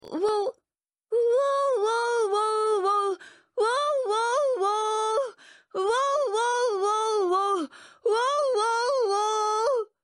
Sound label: whoa